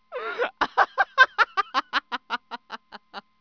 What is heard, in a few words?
women laughing